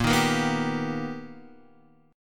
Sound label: B Augmented Major 7th A sharp, chord